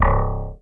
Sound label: POP G2; Greytsounds; Scott Peers Sound Engineering